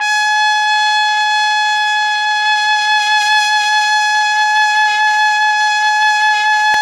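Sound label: trumpet; Roland - LA Composer vol. 3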